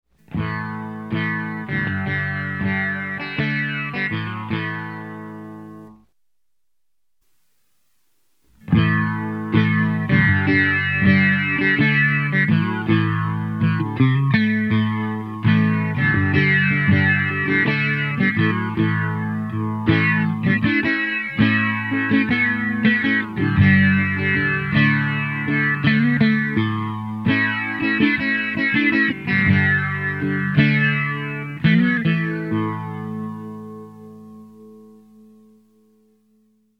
Slow Sixties Swirl; Strymon Iridium → Strymon Lex

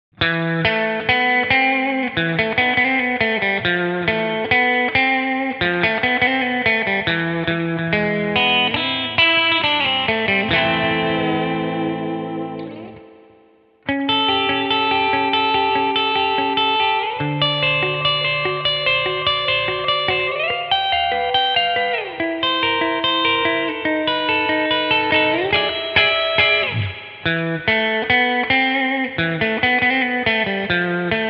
spring Surf; blueSky

